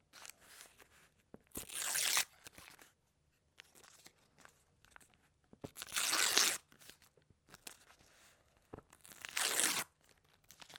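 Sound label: tearing paper sound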